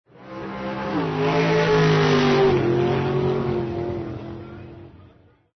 AUTO RACE

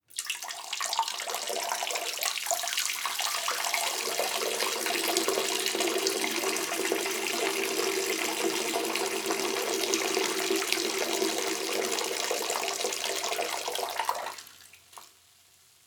Urinating

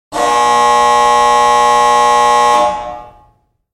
game buzzer basketball

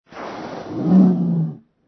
ANIMAL WHALE

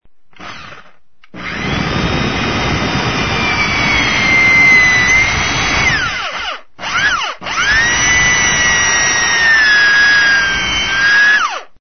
TOOL

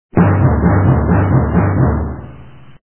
bass drum

